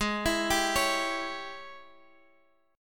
G sharp Major 7th Suspended 4th Sharp 5th, Guitar, Half Step Down; chord